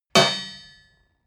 metal impact midsize rich

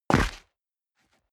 step boots concrete grit jump; Footsteps; schritte

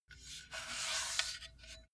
metal pole slide

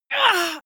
female combat hit soft

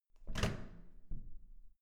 door handle down soft

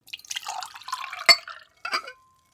glass pour; soundpool

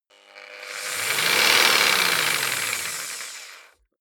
jigsaw engine medium slow short; maschinen; saege

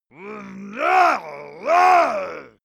monster male growl aggressive; kreaturen

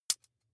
midsize metal thin hit chink; industrie-wirtschaft; baugewerbe

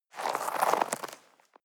step snow walk single; natur-tiere; schnee

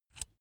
electric drill bit remove; maschinen; bohrer; soundpool

